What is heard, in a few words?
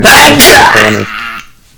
awp; garrysmod